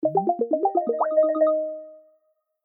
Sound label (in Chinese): 滴水; Teams; Classworks